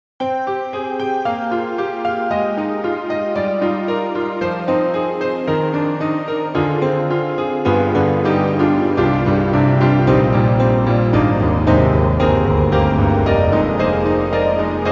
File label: Pop Keys; reFX; Nexus2